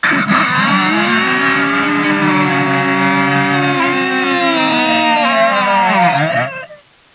zombie idle; svencoop

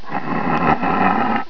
headcrab pain; svencoop

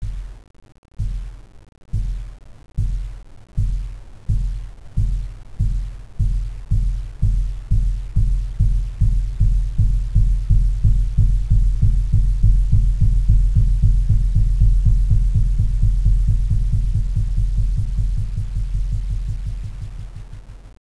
atmosphere; svencoop; sence; mistake coop

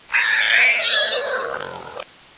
houndeye die; svencoop

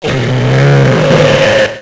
zombie pain; svencoop; sence; mistake coop

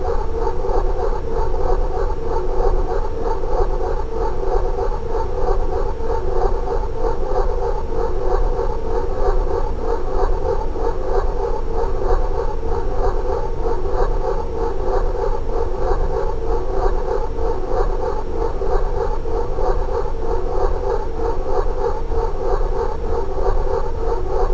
industrial, ambience; svencoop; aomdc

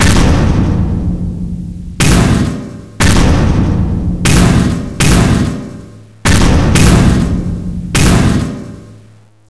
buzzer beating slow; svencoop; sence; mistake coop

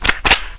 old cocking; svencoop

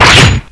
weapon on foot; svencoop; scshogo

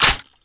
colt slideback; weapons; kuilu

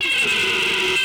claw miss; svencoop; source of life

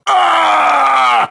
death, grunt; svencoop; st allies; nimble grunt

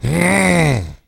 zombie alert; svencoop; justzombies

